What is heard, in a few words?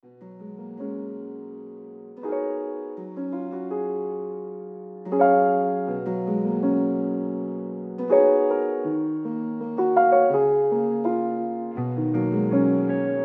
lofi hip hop